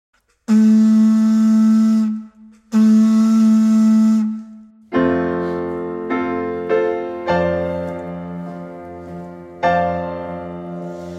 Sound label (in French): Hymne, Choeur d'enfants voix